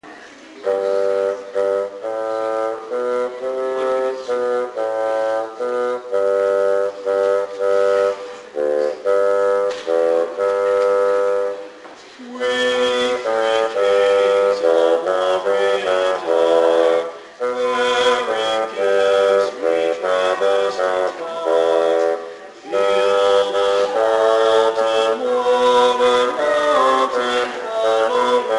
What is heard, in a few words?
we three kings bassoon; choir anthems